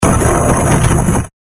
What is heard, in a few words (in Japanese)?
土魔法 小; モノラル; 魔法効果音